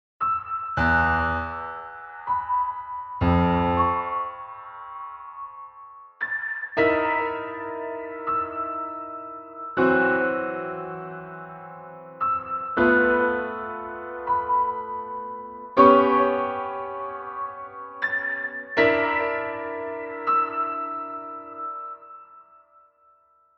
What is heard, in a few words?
piano